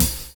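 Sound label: SNARE TO HH; Univers Sons - Kitch 70s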